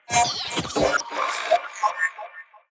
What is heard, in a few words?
DrillGolem What